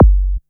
50HZ SY KICK, SYNTH KICKS; Best Service Alpha Dance vol.2

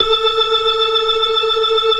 FAST VIB, ORGAN, TREMOLO VIB

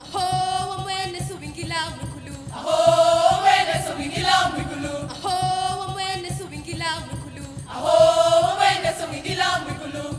AHO D1; Spectrasonics; Heart of Africa 2

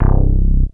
SY BS C2; Q Up Arts; Dream Experience

